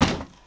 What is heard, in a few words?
Wooden Box Wood Hard